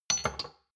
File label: shot glasses stack soft short; soundpool